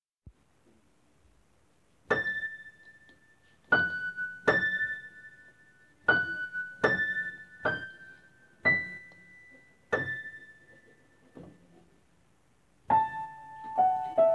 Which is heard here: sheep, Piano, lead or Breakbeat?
Piano